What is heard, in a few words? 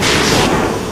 thumper top, machines; ambient; Source Engine; video game